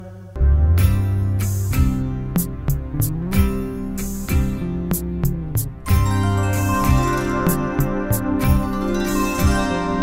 Music Ringtone; Jai Jai Radha Ramana

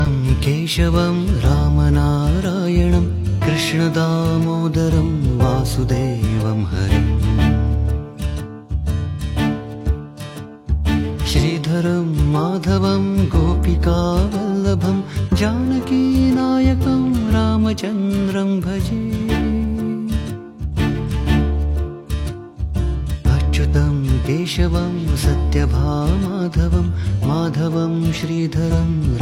Ringtone; Achyutam Keshavam Ram Narayanam

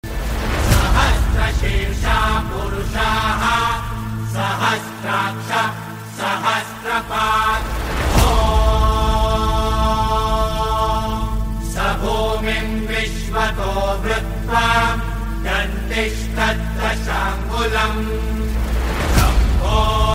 Shiv Rajyabhishek Mantra Ringtone

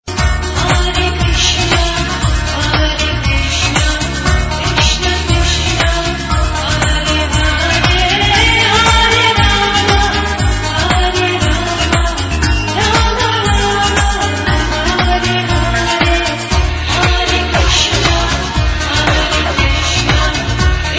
Hare Krishna Hare Krishna Dj Remix Ringtone

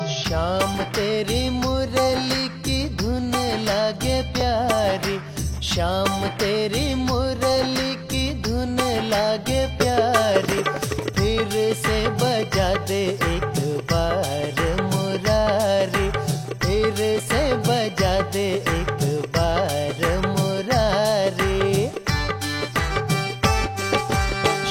Shyam Teri Murli Ki Dhun Ringtone

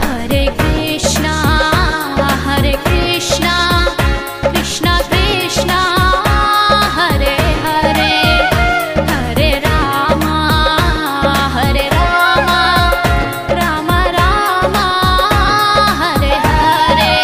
hare krishna Hare Krishna bhajan Ringtone

